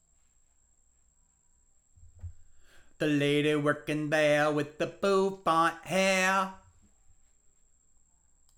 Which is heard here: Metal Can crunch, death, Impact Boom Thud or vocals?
vocals